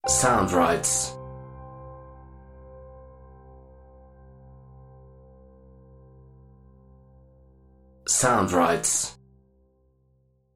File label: Piano Logo